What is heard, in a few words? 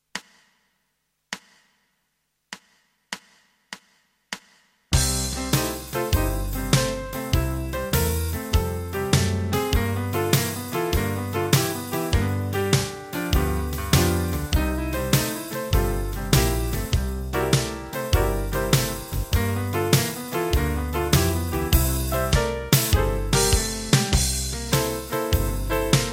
Guitar backing